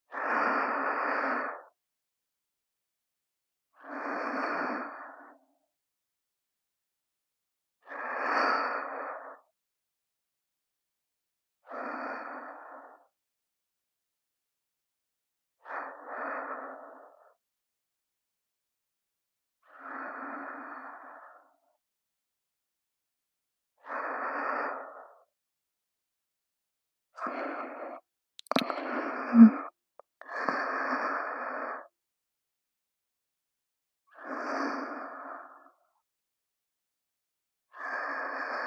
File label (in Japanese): 寝息(右)(ループ); SEあり; 穹の寝息(右)